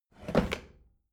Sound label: bathroom drawer open heavy